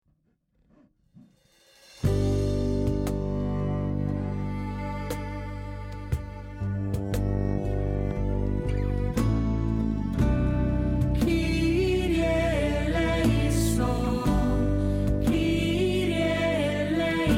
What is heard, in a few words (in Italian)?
kirye eleison violino